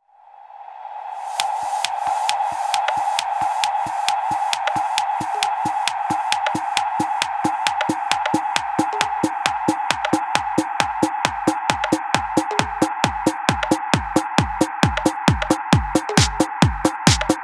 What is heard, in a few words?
Funky Beat